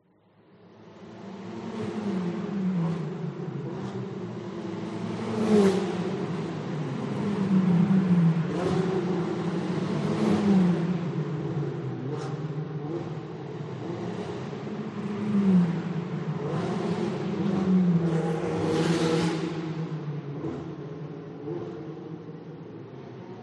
motor racing ferrari 488 cars challenge pass by 5m distance track